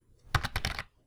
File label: typing